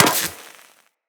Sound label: Laser Impact Metal